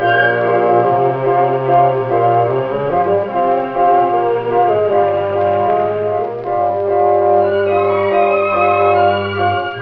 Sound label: strings, Sad